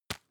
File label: tissue tap